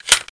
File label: clip in; weapons